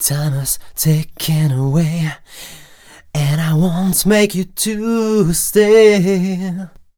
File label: m 128 e-flat, Vocals